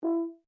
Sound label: HORN E5 P SHORT, French Horn in F; Alexander French Horn in F; Musical